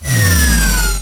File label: Power Down; Apache; Slide Sounds